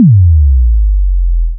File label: Bass drop short